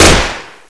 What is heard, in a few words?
dual, weapons